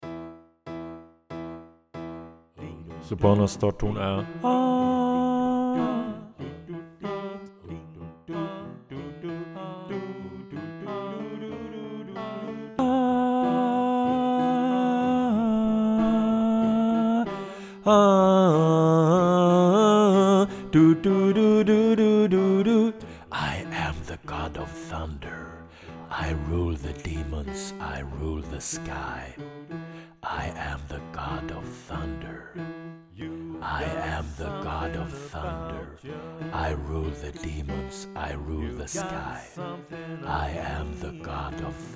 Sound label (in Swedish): sopran